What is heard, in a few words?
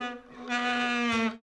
Band - Hit sad sack; Ed Edd n Eddy; Adromulus Pack